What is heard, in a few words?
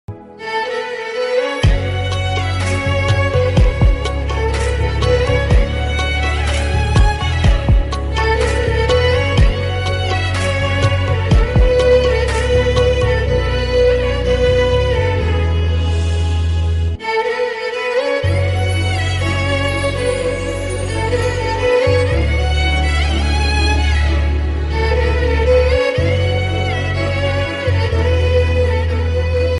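love background music ringtone; atithi devo bhava movie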